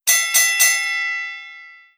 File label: stop; harbour-boxing-timer